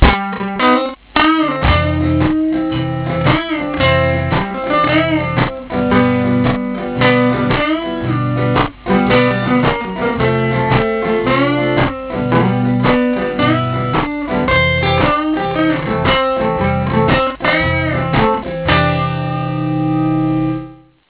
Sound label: shuffle